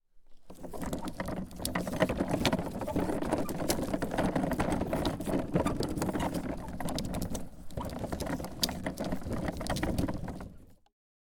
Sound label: Wheelbarrow